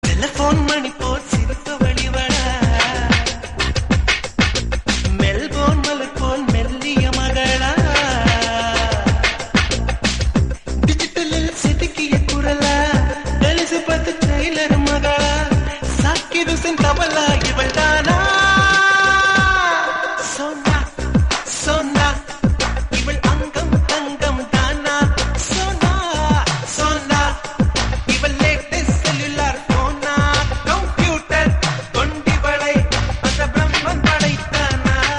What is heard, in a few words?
Tamil Song Ringtone; Indian